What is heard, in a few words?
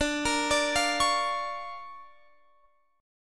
D Minor Major 7th Sharp 5th, D, down, Guitar Open F; arpeggiated